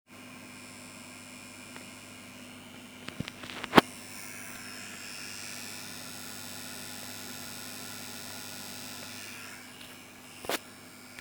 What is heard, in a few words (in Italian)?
rumore fondo